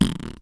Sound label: fart